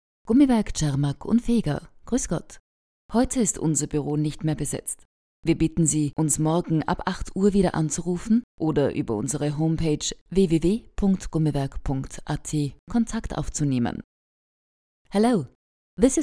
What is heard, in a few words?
audio jingle; gummiwerk